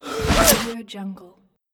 female stabbed

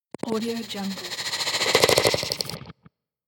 crawling alien insect

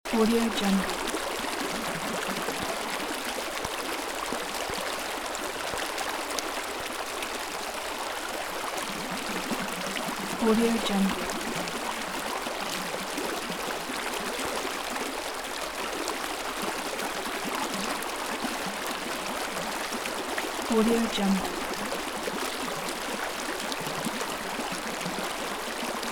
water stream; sviridpavlov

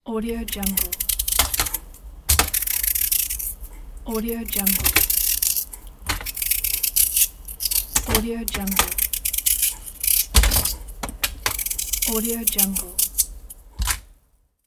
cutting a potato with a knife

